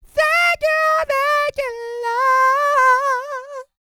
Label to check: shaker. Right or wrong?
Wrong